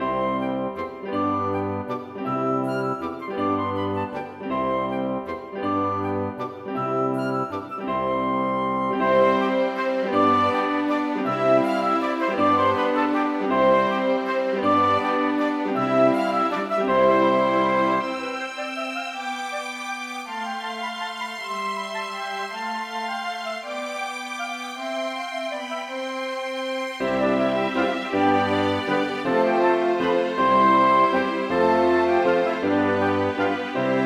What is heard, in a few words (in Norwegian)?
Menuet for stort ensemble; Aamodt